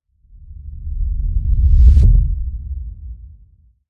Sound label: Ultra Sub Hit Deep; Ninja Tracks